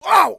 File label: bump